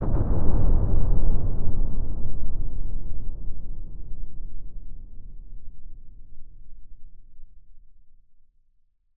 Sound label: deep cavern